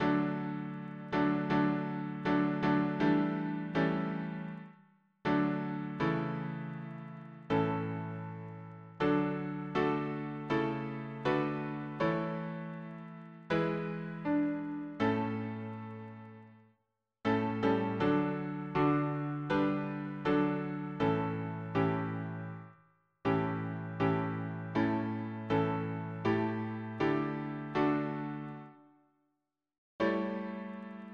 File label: harmony piano